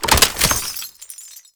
Belt Fed Rifle Reload; Warframe; Tenno; Frumentarius

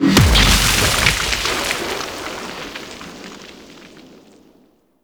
Escape Ground Burst; Squad Link; Sentient Condrix

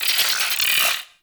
Venus Robo Fish Medium Caught; Warframe

